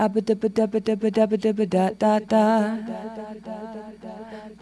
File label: Vocal Echoes